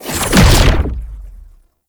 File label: Stab Cloak Enter; Enemies; Abilities; Warframe; Tau; Dax Ninja